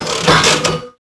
slot machine pull lever; Max Payne

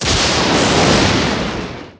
thunder

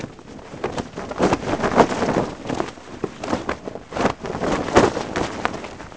flag; The Elder Scrolls III Morrowind GOTY; XBOX

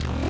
droid servo

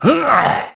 dead, male; Pirates Of The Caribbean

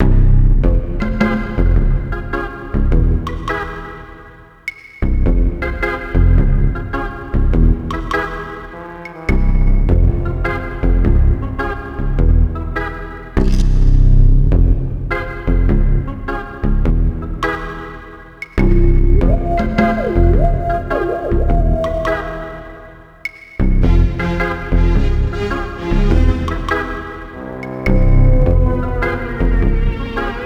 music cavern; Ty The Tasmanian Tiger 2 Bush Rescue; XBOX

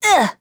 Get Pebble; Finding Nemo; XBOX

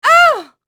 attack voice; UFC Tapout; XBOX

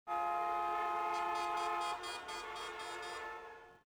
horns, nyc; Soldier Of Fortune II Double Helix; XBOX; ambience

